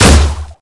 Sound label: crossbow; garrysmod